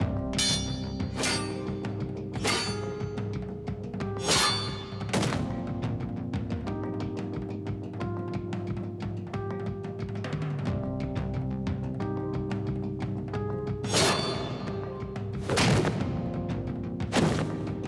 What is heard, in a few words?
no melody synth; mcsm music; Netflix